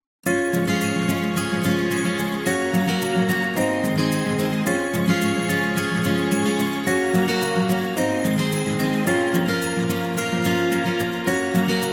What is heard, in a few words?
The Christmas Upbeat